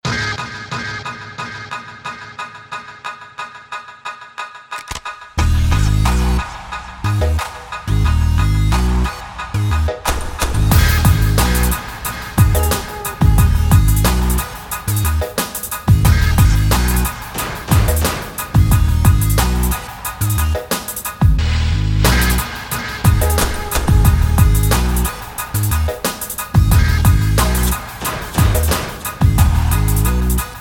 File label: music; basketball